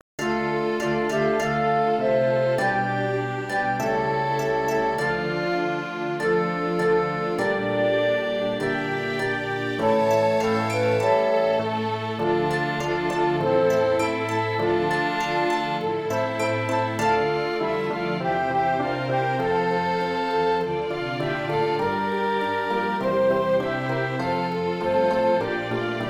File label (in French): ORCHESTRE